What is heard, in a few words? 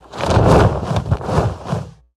Dragon Wing Flap Large Attack Underlay